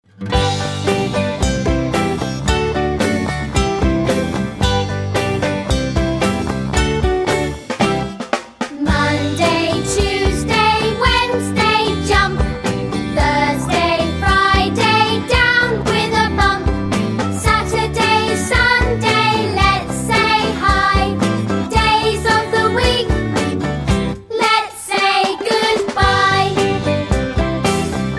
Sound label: karaoke